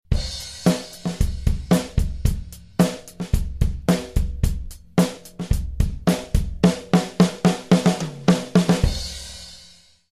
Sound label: beat